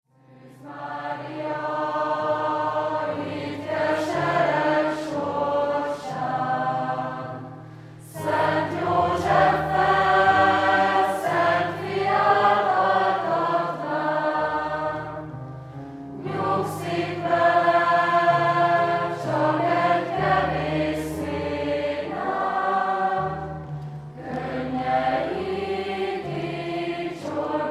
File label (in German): Ungarische Weihnachtslieder